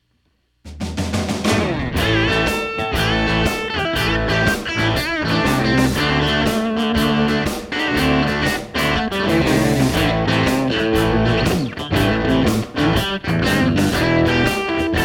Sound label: blues tele